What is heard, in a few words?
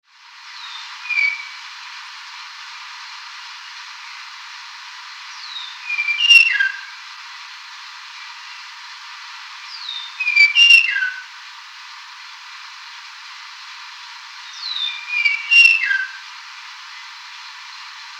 redtailed palm thrush Kinshasa 2am